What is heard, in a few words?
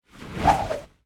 Mantis Heavy Attack; gamerip